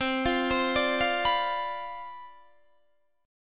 C Major 7th Suspended 2nd Suspended 4th C down Guitar Open D, chord